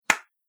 Single Hand Clap; Body Sound Effects; Human Body

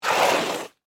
Shovel Scoop Mud; Yard Tools (Sound Effects:Tools); BlastWave

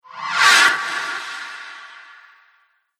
Reverse Cymbal Whoosh

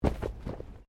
Body movement Impact Roll

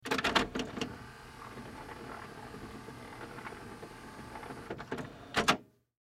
Cd Player Change Disc; CD Players; Electronics; Technology; Blastwave

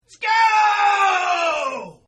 Yell; Voice; Partners In Rhyme

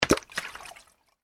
bottle plastic water movement; Bottle (Plastic) Movement With Water Inside; Materials; foley; McKinney Sound

